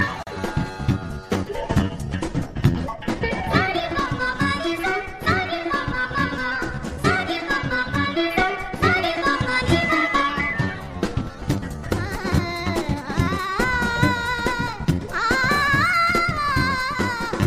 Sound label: Funny Ringtone